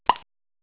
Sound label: pop